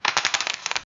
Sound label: cards shuffling poker game